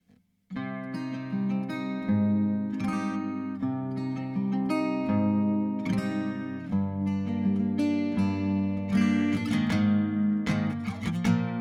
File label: acoustic shades guitar fx